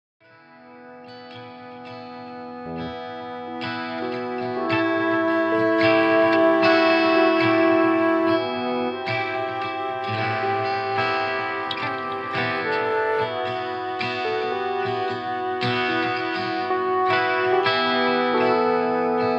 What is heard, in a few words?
piano